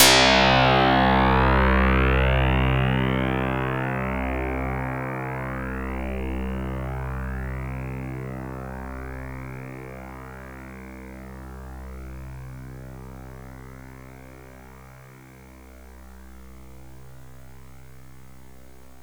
fbam abstract physmod

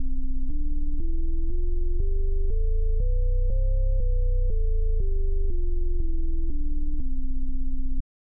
c major scale bass harmonic imperfection, hammond

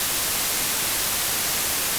gauss

